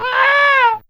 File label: Chirp, Animals; Fairlight CMI IIe